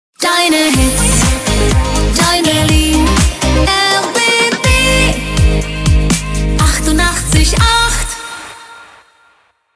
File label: Transition Short